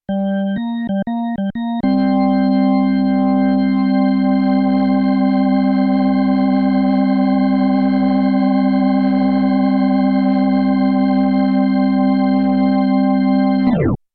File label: b4000 rotator